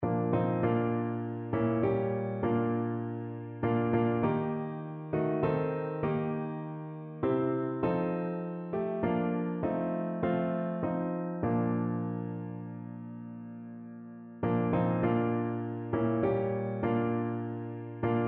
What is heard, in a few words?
rugged cross, piano